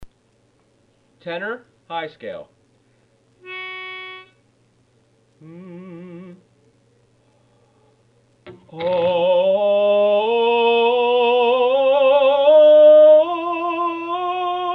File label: tenor high scale